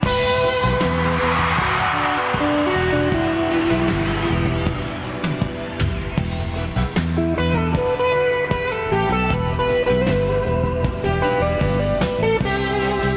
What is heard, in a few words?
Synclavier